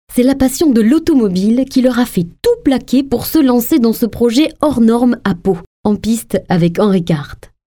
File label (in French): Jingle Economie